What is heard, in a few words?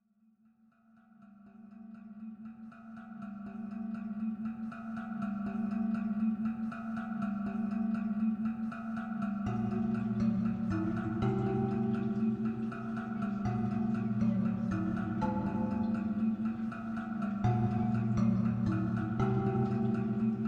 Waterharp